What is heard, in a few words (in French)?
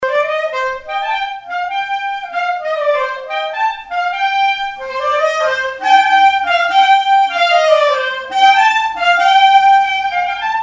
jeu riqueniee, clarinette